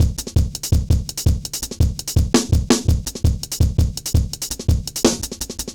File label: Jazz Shuffle, Drum Loops